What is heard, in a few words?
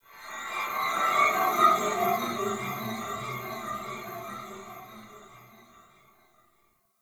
Chime Texture; Warframe; The New War; Zariman; Apartment; Scene Four